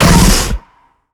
chimera pain; mutants; STALKER; customnpcs

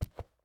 cloth, step; STALKER; minecraft

